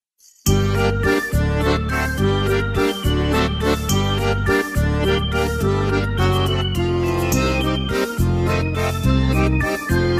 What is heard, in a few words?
Organ